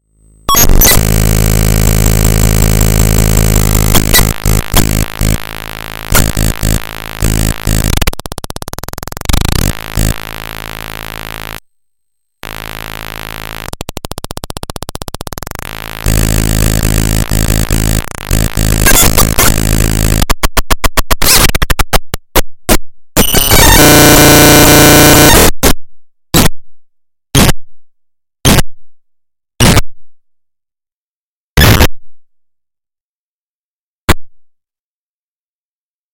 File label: circuit bent gameboy noise